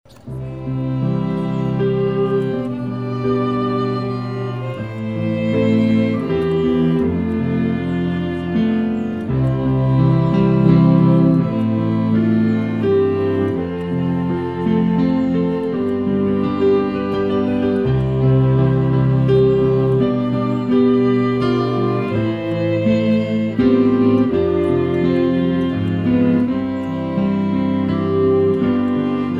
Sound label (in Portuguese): Piano e Cordas